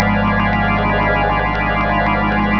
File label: MELODRAM